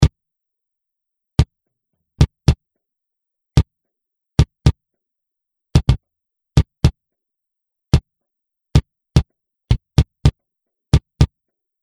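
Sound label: bd gated, bass drum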